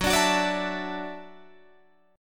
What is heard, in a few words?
Gb Minor 7th Sharp 5th, Guitar DADGAD